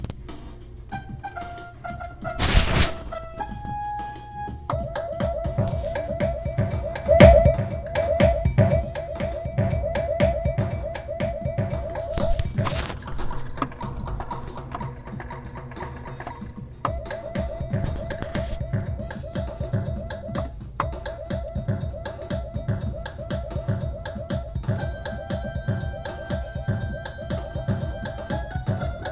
making music; during my lunch break at guitar center

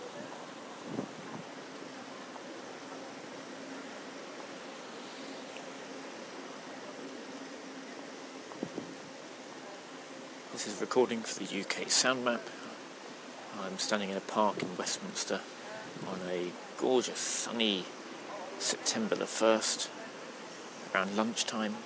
A park in Westminster; uksm

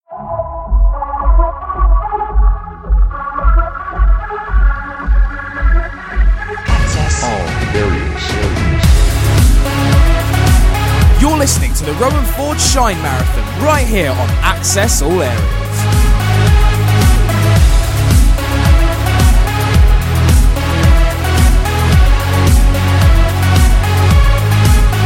marathon jingle; k15 shine

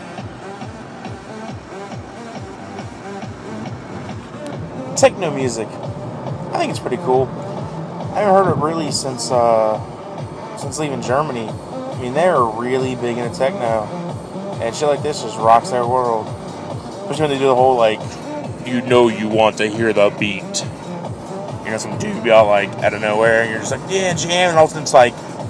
techno music

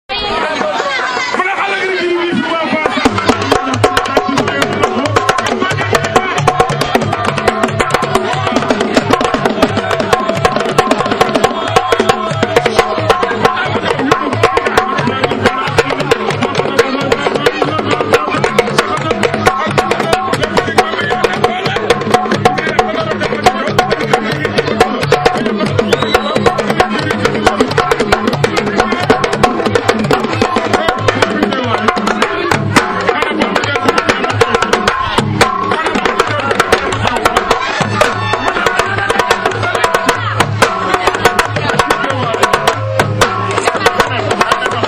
sabar drumming in Senegal

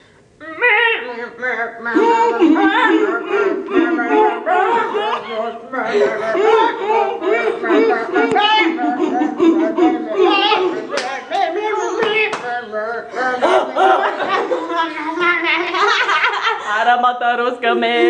humming

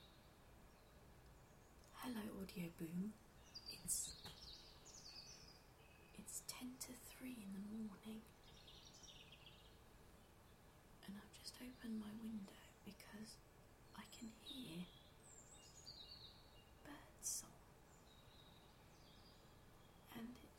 nocturnal birdsong in west london in january

